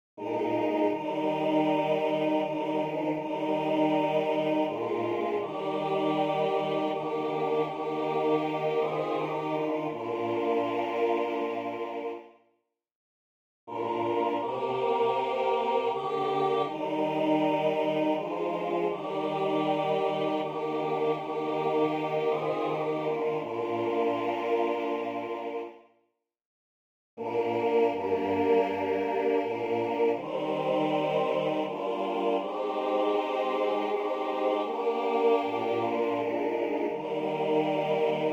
An easy arrangement of a Buddhist chant on compassion, sacred choral